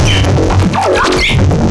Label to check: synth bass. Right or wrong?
Right